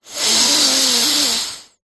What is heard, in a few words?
human male breath in through nose stuffy